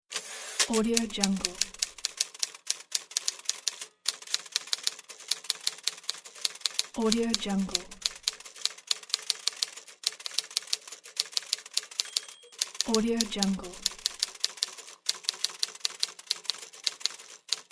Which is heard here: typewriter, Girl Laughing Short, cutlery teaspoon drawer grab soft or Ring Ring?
typewriter